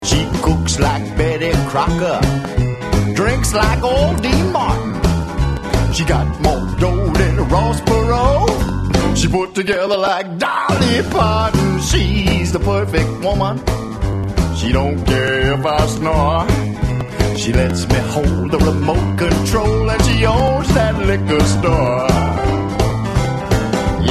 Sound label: SWING